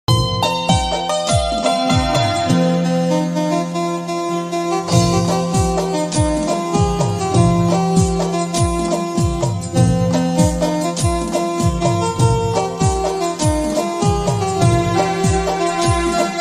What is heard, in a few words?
gujarati ringtone